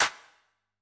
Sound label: Clap, drum